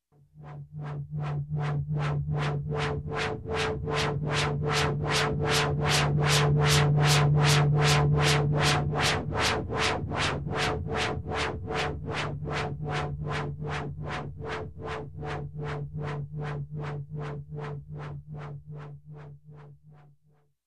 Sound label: Pulsating Electronic Tube